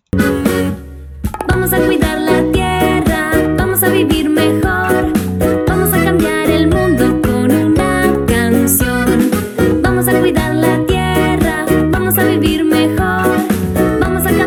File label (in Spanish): musica